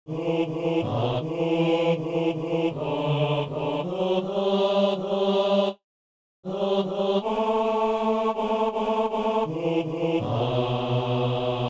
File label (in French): psaume basse; Psaume 71; Messe du 17 janvier à Saint-Denis